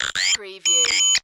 Alien Transmission; sound effects bible

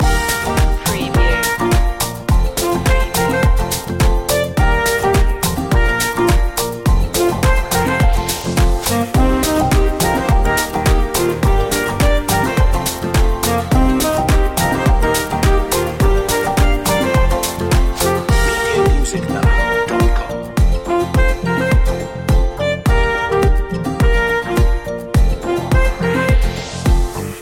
island beats loop

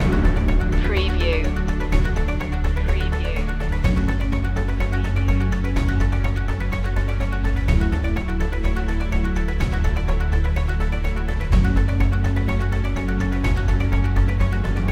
machine land loop

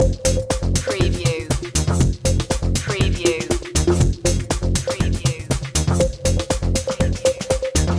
turn on loop

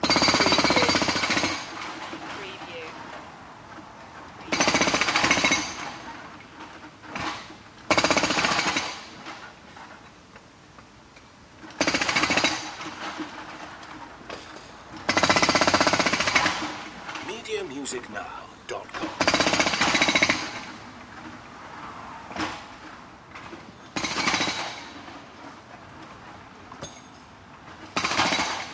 construction site hammering loop

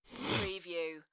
Sniff; beanstalk audio

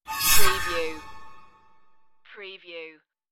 magic exit swirl

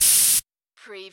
Paint Spray Gun Short

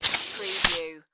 Spider Web Shoot

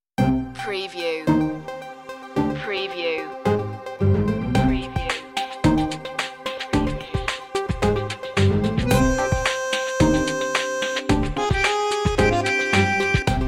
tango chill

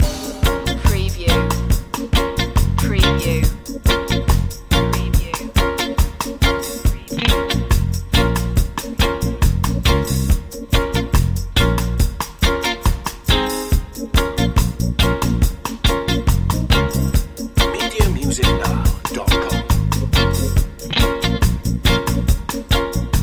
soul of reggae loop

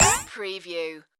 funny game menu select

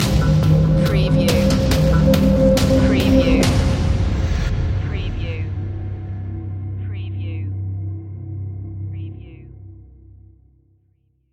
calming storm stinger

